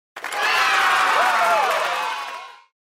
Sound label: cheers